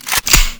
g3 bolt pull, weapons; garrysmod

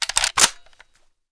m82 bolt pull, sniper; weapons; garrysmod

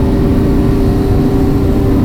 second level, car; vehicles; garrysmod; sincar

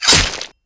knife hit, weapons; garrysmod

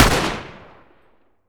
m16a2 fire, weapons; garrysmod

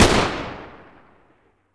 m60 fire; mg; garrysmod

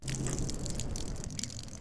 piss; garrysmod

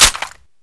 g3 bolt catch, weapons; garrysmod

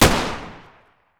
vollmer fire; mg; garrysmod

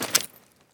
m60 box out; garrysmod

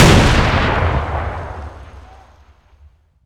m67 explode; explosive; garrysmod

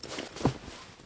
rpk deploy, weapons; garrysmod

